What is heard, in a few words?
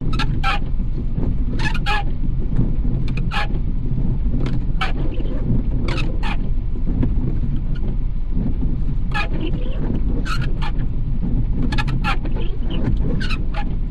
vehicles car windshield wipers operate engine run idle internal binaural loops